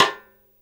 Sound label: rim, Drums Acoustic; USB Soundscan vol.10; AKAI; 90s sample CD